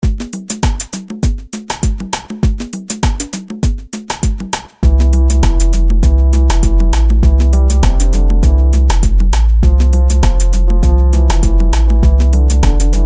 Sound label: chill tech